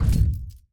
Hand Paddle Attack Hard

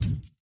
Hand Paddle Attack Light

